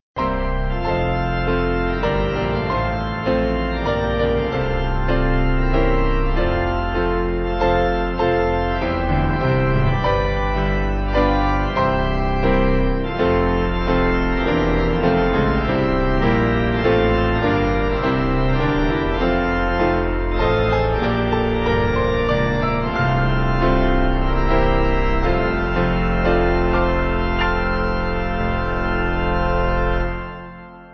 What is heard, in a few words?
Piano Organ